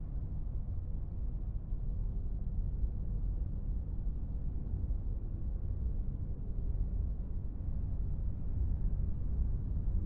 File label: Radiostation Basement Dark Ambient Loop; horror and atmosphere fx; Silent Hill Downpour